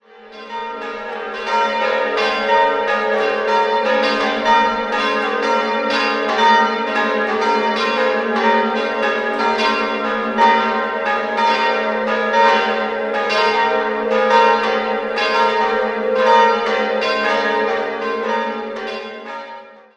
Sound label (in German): Pfoerring, glocken